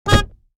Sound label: Racing driving game menu ui error